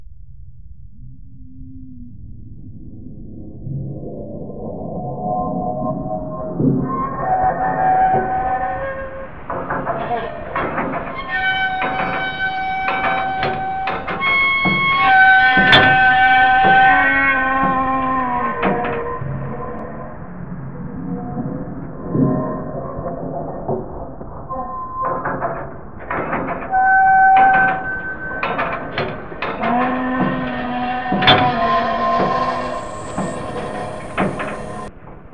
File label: monster; soundscape